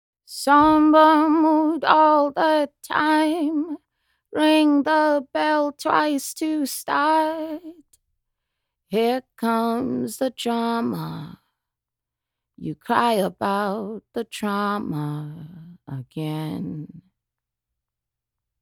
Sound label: Jazz Blues 120 EbM, acapella